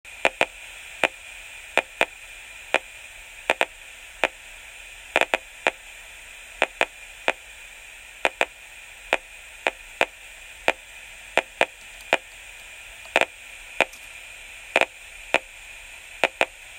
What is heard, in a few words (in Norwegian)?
AMS signal; Smartmeter AMS (Aidon)